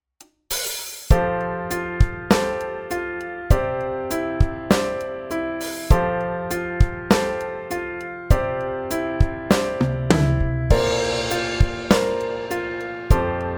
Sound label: Pop Ballad Piano Chord Progression